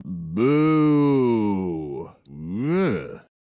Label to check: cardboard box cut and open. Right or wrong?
Wrong